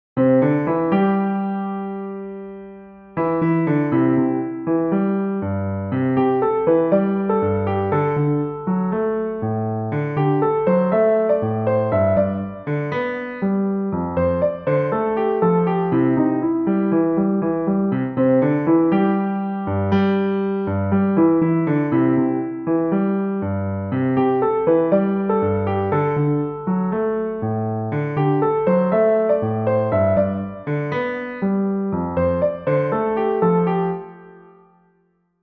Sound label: solo, piano